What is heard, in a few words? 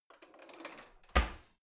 old drawer creaky in half